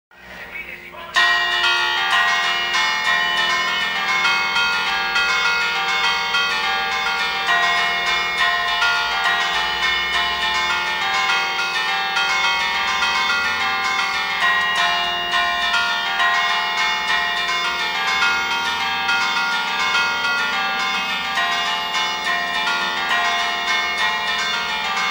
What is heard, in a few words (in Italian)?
campane